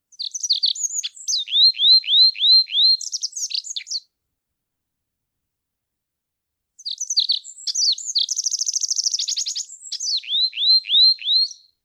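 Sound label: olive-backed pipit song, birds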